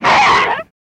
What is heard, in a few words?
Monster Cry Throaty, bears